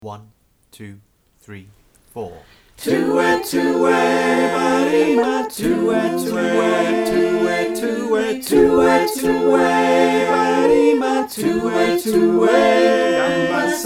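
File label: soprano alto tenor bass Mix, choir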